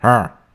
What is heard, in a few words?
grunt